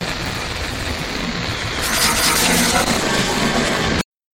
death all

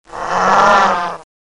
baby death; cof

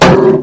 heavy laugh evil

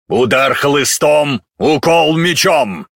warcry; hellagur; zombie riot

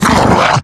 death, male zombie; nmrih; zerosiki; slender

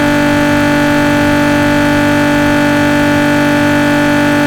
high, vehicles; 350zra; nfs heat ganimator pack

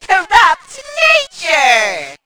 atk corrupt nature; roblox; coolkidd; slender

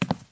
footstep; slender

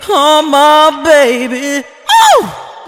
spy laugh short; spy jackson

